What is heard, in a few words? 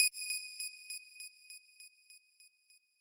lead